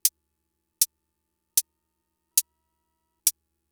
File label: MFB-522 CLOSED HAT; Fricke